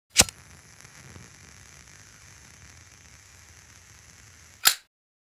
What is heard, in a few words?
Zippo lighter ignition lighting up and fire burning